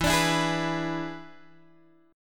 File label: E 7th E down Guitar Open G